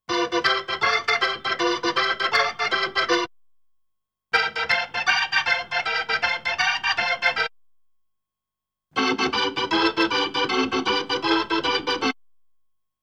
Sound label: B3 Organ; Masterbits CLIMAX 8